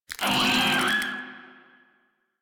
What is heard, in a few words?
Desert Skate Vocal Pain E; Warframe